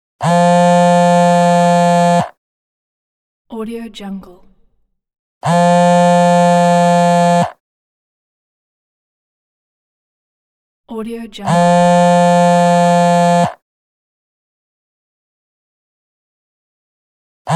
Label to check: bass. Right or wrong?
Wrong